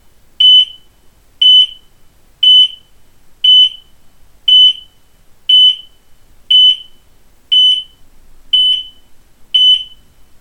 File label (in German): abus rwm250 hitze alarm, Rauchmelder